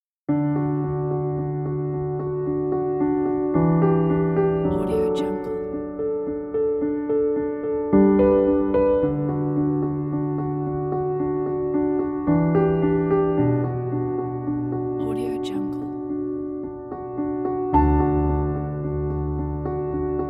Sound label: wedding preparation piano